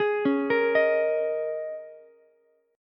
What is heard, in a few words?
A sharp Minor 11th A sharp down Ukulele C6, chord; arpeggiated; JGuitar